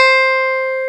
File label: DAMP GTR C5; AKAI S-Series CD-ROM Sound Library VOL-2